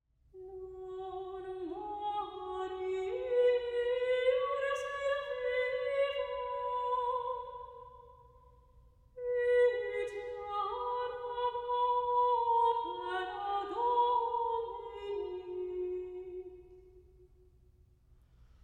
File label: SATB; Non moriar sed vivam; Luther